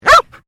Animal Dog Border Collie Female Bark; Various domestic dogs; Dogs (Sound Effects:Animals)

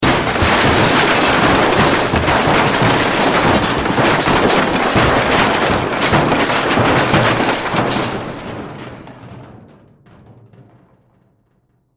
Crash Roll Metal Glass Debris Large Object; Blastwave

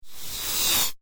Fast Insectoid Flyby Shrill Whoosh; whoosh sound effect; Andrew Fleming

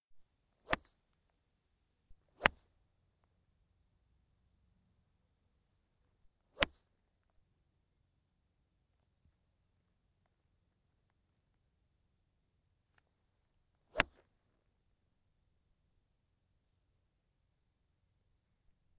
Golf, seven iron shots (seventh misses ball); Sports; BBC

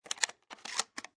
Shotgun Load Round; Gun Handling; Sound Effects:Guns

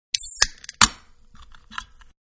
Soda Can Open; Drink Mixing; Bars; Can Soda-Open; Manhattan Production Music

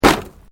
Metal Light Movement Minor; Materials

